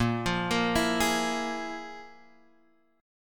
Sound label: F Minor Major 7th Double Flat 5th, A sharp